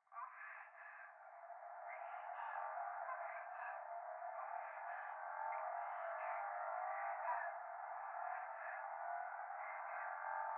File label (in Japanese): 風呂 右耳密着処女喘ぎ 車の中